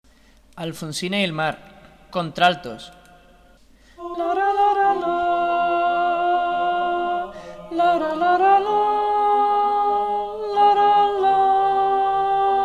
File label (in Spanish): contraltos